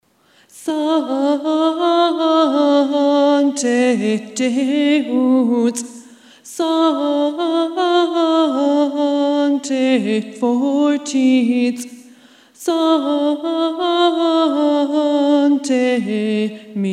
Alto; Media Vita; Lent/Holy Week 2013 Rehearsal recordings

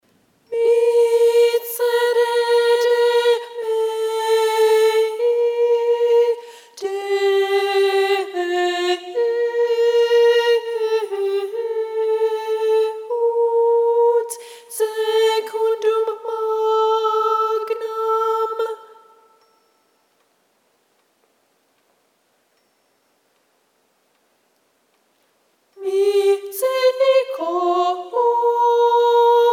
Allegri Miserere 2nd Soprano; Lent/Holy Week; Allegri first page Soprano 2

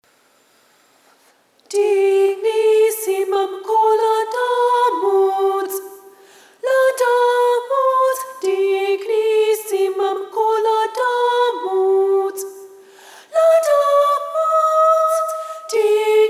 Aufer a nobis Soprano

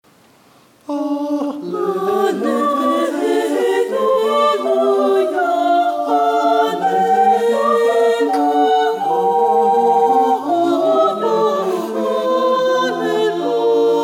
Dic nobis Maria SATB

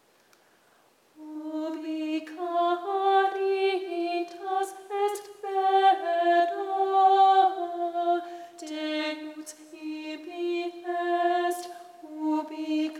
Ubi Caritas Soprano; Lent/Holy Week 2013 Rehearsal recordings

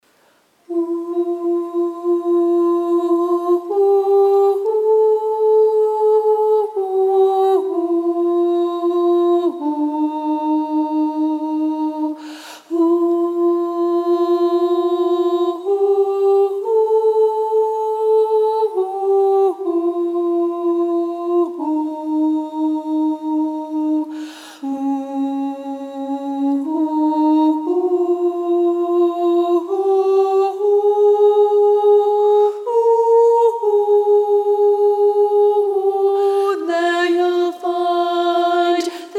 Soprano; Wexford Carol

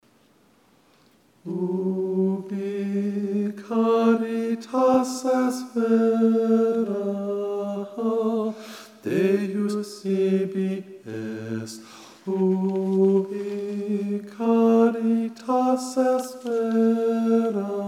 Ubi Caritas Bass; Lent/Holy Week 2013 Rehearsal recordings

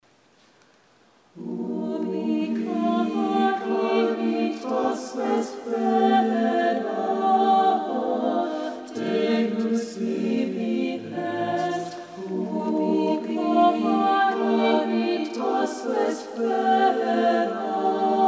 Ubi Caritas SATB; Lent/Holy Week 2013 Rehearsal recordings

